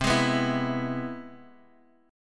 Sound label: C Augmented Major 7th, Guitar Open D, chord